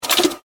Lock Robotic Click, Scifi, Lock, Robotic, Click, Science, Fiction; Robots; BlastWave